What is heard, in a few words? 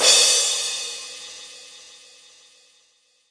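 Crash C2, Boss DR660; drum machine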